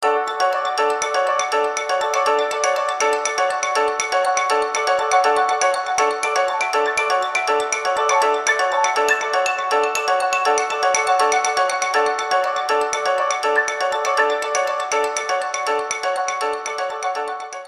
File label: fractal Ring Ton; X6